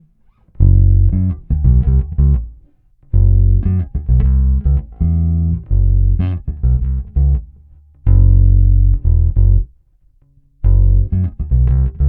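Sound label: Neck, bass; PJ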